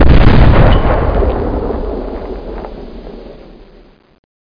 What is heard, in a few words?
expl low; rtfire